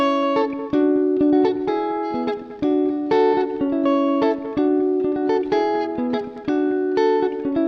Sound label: Sample, African guitar samples